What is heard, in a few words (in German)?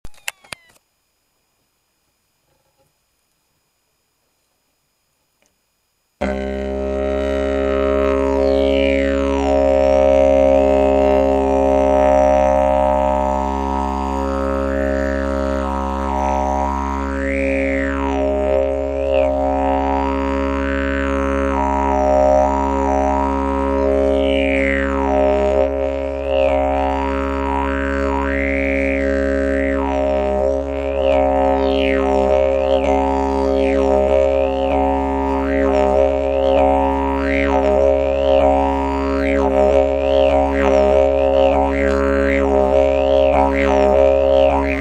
Ahorn Cis, didge